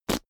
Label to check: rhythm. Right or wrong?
Wrong